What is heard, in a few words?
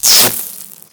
jumpercable sparks; favela; Call of Duty Modern Warfare